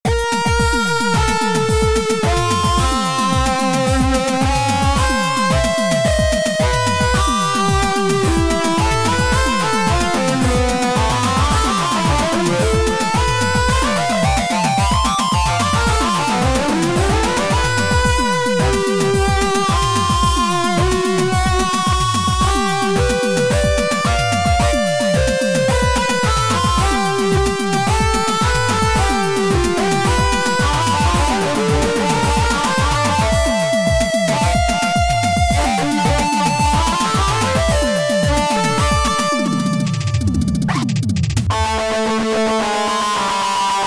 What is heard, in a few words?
a Minor Scrape